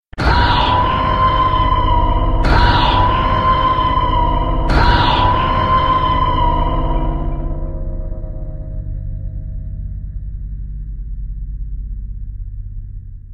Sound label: Prometheus Siren